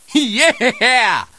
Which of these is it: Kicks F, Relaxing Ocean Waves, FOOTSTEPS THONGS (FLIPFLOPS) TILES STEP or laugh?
laugh